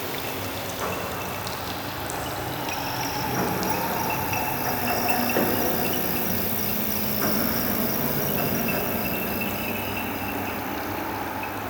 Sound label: Amb Loop; Warframe; The New War; Zariman; Interior